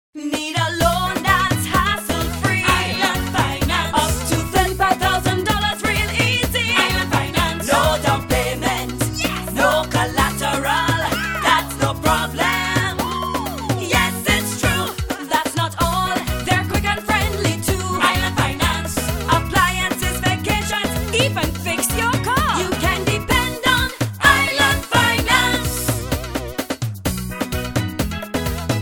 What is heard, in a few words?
Jingle